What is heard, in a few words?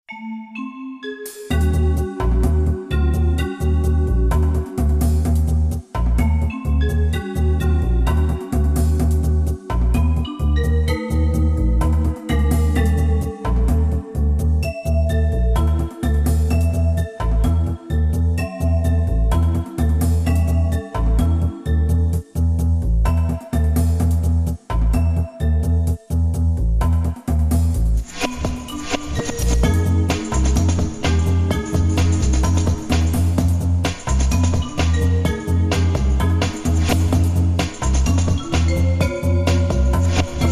creeping scary's; Growlanser Portable; PSP